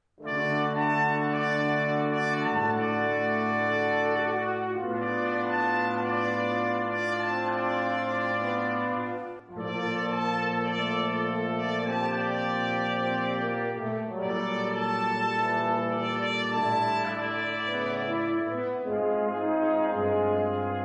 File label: Fanfare